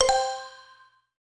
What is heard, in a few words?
dismiss warning